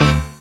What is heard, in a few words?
syn d, Dance Synths Stabs & Hooks; vol.37 - Dance Synths